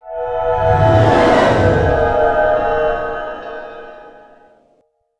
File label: dispell hex; uwc3x